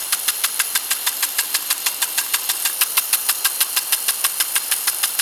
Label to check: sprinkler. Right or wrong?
Right